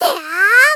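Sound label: Polite, Attack, "Deyaaa", Japanese female; Rei Yumesaki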